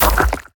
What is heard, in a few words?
sand hit; smite; Anhur